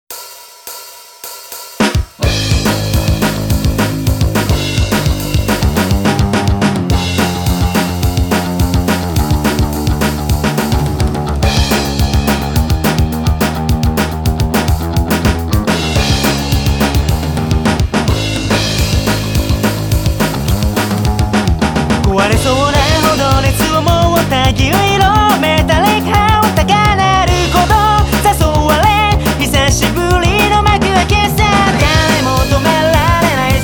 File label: Guitar Minus Track